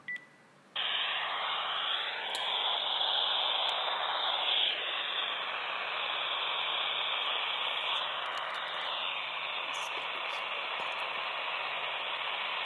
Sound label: Phone Line